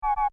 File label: Menu wrong, Wrong / Invalid Selection; Menu SFX; Buttons; Multimedia; Stuart Duffield